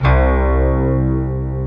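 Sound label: GUI, Slow Strings; Optical Media International - Sonic Images Library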